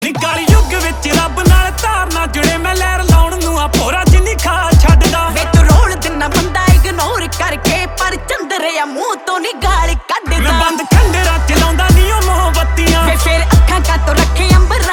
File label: PUNJABI SONG RINGTONE